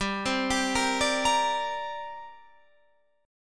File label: D 7th Suspended 4th Sharp 5th, G